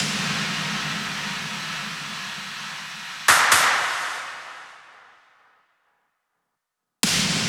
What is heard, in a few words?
Loops 128BPM, Drumloops